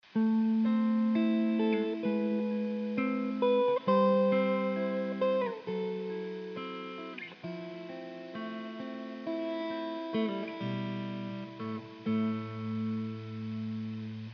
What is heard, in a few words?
warp clean, guitar